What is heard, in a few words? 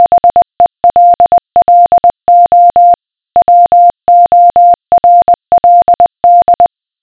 morse; gr-satnogs